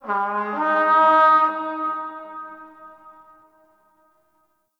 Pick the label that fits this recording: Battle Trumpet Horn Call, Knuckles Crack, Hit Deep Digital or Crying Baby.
Battle Trumpet Horn Call